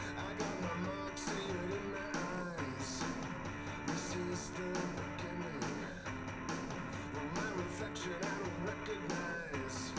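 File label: with drums live rec 250ms 1m mix; SiSEC10; underdetermined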